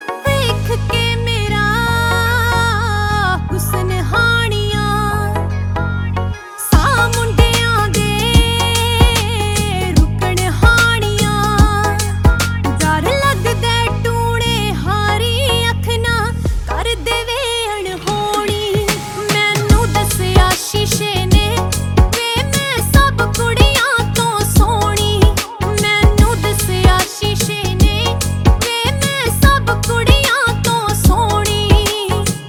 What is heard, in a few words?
Female